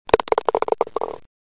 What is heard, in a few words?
Golf Ball Falling into a Golf Hole